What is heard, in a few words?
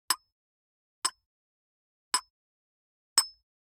Generic Click Glass Small